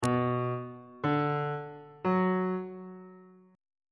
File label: g sharp locrian chord 3 is b minor on bass clef